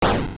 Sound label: ThrowStaff; Fourth form throwing its staff; NightmareShadow4